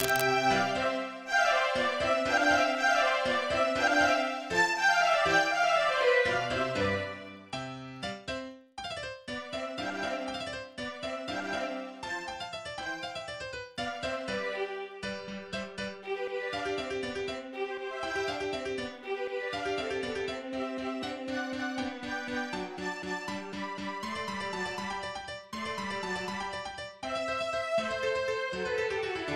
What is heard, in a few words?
Piano Concerto